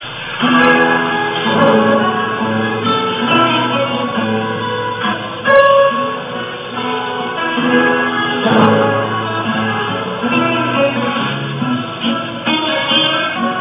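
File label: gavotte, guitar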